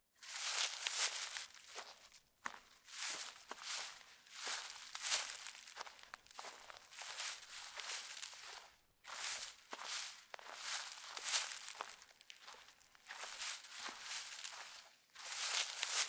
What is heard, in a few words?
Search In Grassy Places; LOOPERS